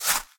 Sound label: digging